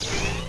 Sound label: invis; hl1mp; XDM; hassassin